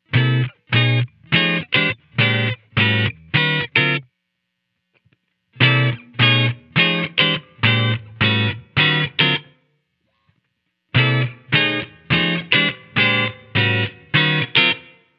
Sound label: glass nexus wg reverb; Damage Control